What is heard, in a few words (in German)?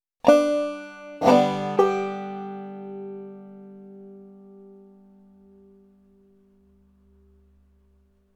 das bum ditty